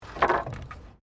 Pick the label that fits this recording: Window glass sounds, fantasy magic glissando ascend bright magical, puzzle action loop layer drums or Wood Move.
Wood Move